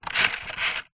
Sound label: leisure rc toy car wheel turn motor plastic